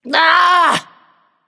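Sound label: ugh, female; smite; voicepack; hera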